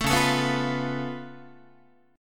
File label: C sharp Augmented 7th C sharp down Guitar, chord; 12,9,12,9,9,10; strummed; JGuitar